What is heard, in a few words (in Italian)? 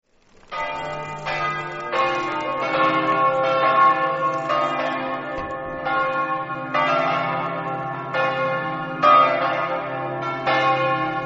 campane